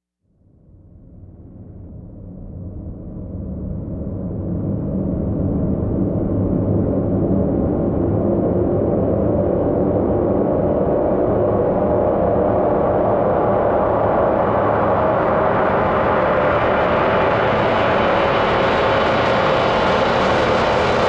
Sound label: Rise; Ninja Tracks